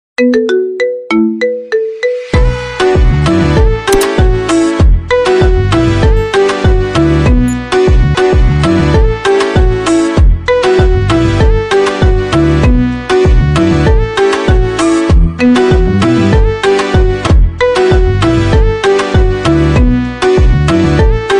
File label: IPhone x Kompa Ringtone